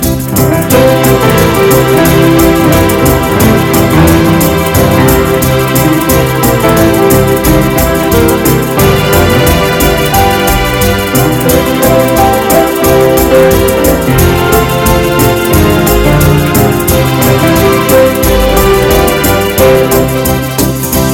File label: Great Dancing Song